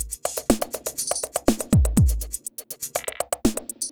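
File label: Tweak Drum Loop 122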